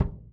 Soft, Kicks; Airwindows

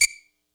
kit, Yamaha Motif-ES8

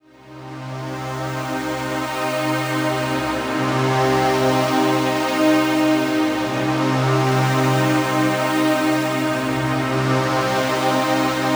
Sustained String Wave Pulse; Harmonic Atmoshperic

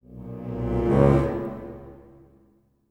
HIT, full orchestra; Orchestral Loops; FULL ORCH ACNT

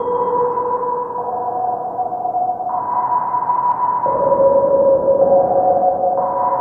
Hyperspace Melodic, Washes; Sonic Foundry (Sony Creative Software); Myths of Technology

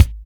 GAP KICK, HIP-HOP; Drums In Your FACE!

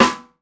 RMSHT, 50S JAZZ KIT; Northstar - New Gold VOL-1

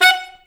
SAX A. STAB, SAX Alto Section; Roland LCDP07 Super Sax; Alto Sect FX

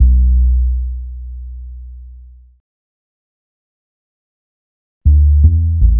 Bass, Futurist Drum & Bass

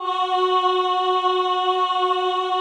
CHO, Roland; Sound & Vision - Gigapack I CD 2 (Roland); Mix-Combi 1

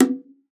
Bongos; Best Service ProSamples vol.42 - Session Instruments

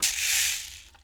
Berimbau Shaker, World Percussion; Sony CS

